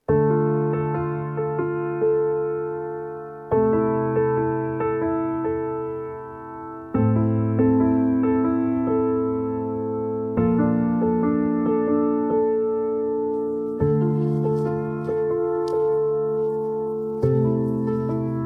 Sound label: piano Version